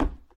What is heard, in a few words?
wood step; Golden Crayon Sounds